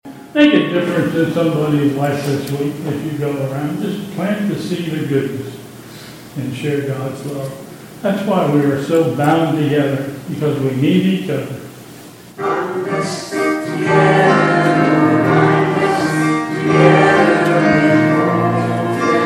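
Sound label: Closing words and closing chorus, church service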